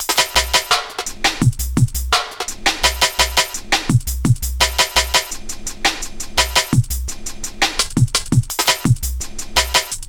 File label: beat slicer; Max